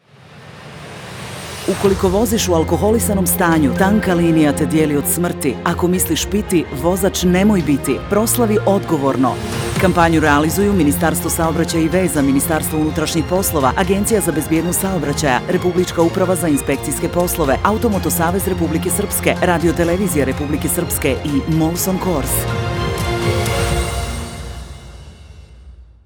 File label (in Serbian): radio dzingl